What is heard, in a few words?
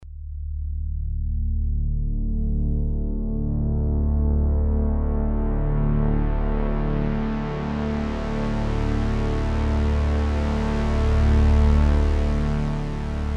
Lowpassfilter Moog